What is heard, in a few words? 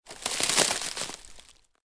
Ivy Bushes; Blind Quest - The Frost Demon